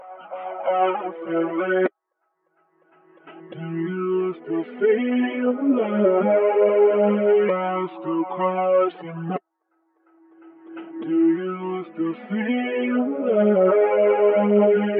Reverse Vocal - 128 BPM C# Min; Feel The Love; Cymatics; Infinity Vocal Collection

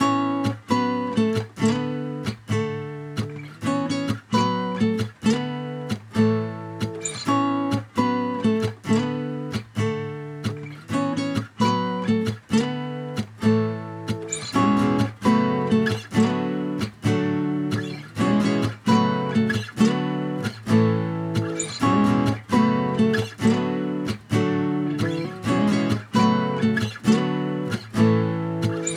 132 BPM A Maj, Guitar; Tulips; Cymatics

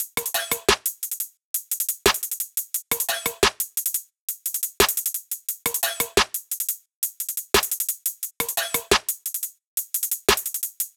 Top Loop - 75 BPM, Hip Hop; Drums - Loops; Cymatics - 9God Hip Hop Sample Pack

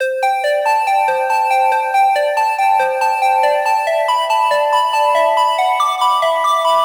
Melody Loop Emin 140BPM, dubstep; Glitter; Ghosthack; Dubstep Sounds 2025

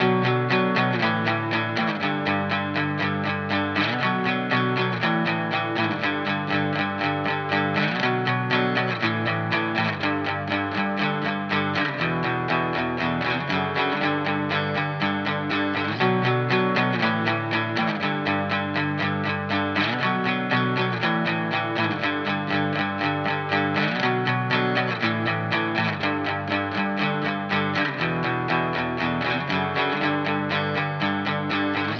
120 BPM B Min Main Guitar; Loop Stems; Cymatics; Miami Guitar Pack - Pt 1; Ashes

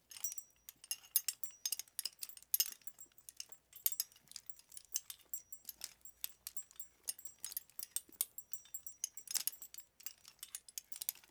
Metal Chains Movement; Fairlight Sound Lib

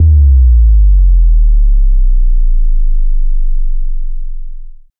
Impact; Hits

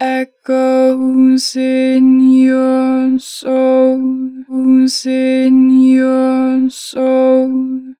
Echoes, Harmor Vocals; 4 bar flat; Pitch Flat

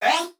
Processed Chant - Slider; Cymatics; Euphoria Vocal Sample Pack

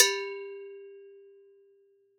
Cowbell Copper; World Percussion; Cymatics; Emperor World Percussion

